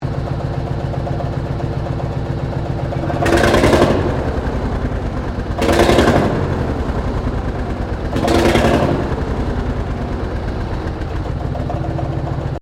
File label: Tractor Lance Bulldog Model Q Rev, Old Farm Tractor; 1954; Tractors; Vehicles